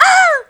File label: pain; angelyss